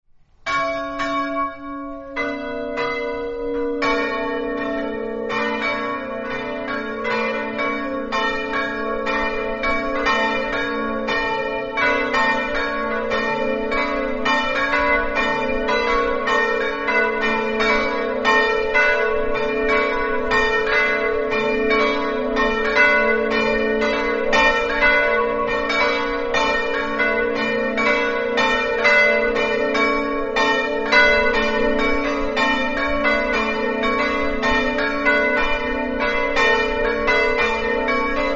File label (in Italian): campane; laforeano